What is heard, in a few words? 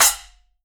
10 SPL CHOKE, FX CYMBALS, Living Drums; Sampleheads; Peter Erskine Living Drums